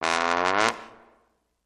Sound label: Trombone Low Rip Up